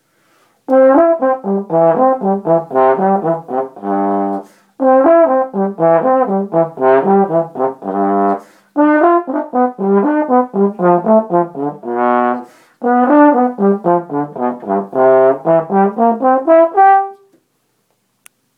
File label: Trombone